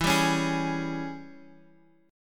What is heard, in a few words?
G sharp Major 7th Suspended 4th Sharp 5th, guitar